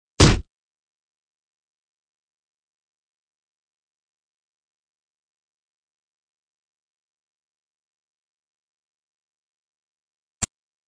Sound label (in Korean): Fights, 싸움-전투; 헐리웃특수효과음